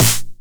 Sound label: 909 SD Tape Sat